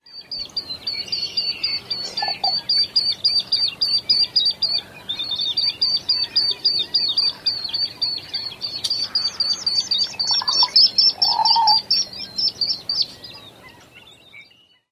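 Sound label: immature begging calls, pied-billed grebe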